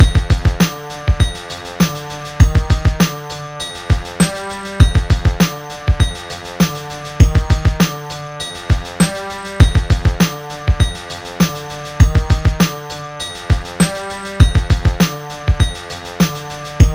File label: Horn E Beat Ringtone